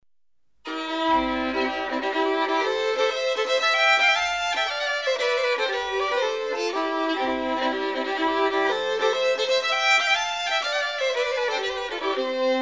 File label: Polska; Efter Pers